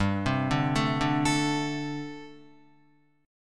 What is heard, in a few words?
G Suspended 2nd Suspended 4th, Guitar; Gsus2sus4; arpeggiated; 2,3,2,2,2,5